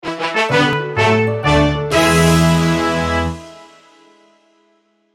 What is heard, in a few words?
Jingle Fantasy; rpg maker mv